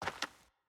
Footstep Dirt

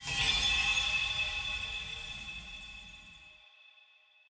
UI Event Spirit Appear; bloom minigame

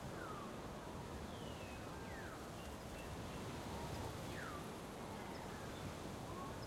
Ambience Ground Floor

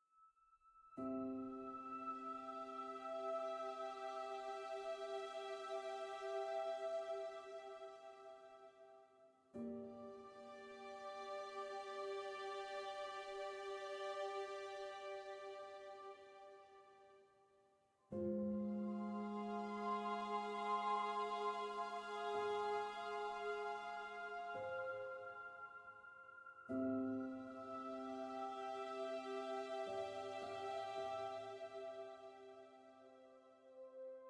Music Outside

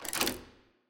Lock Dial Up; Puzzle

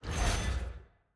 UI Menu Complete Appear

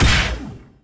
attack light; bloom minigame

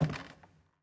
Footstep Basement Wood

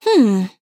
Nonverbal Encourage; Aurora

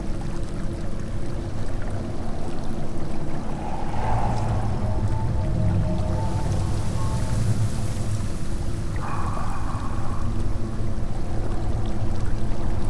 Amb Boss; bloom minigame

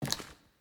Footstep Glass

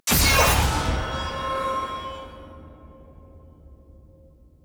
ui button click; spirit blossom

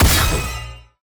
attack dash heavy; bloom minigame

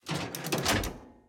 Turret

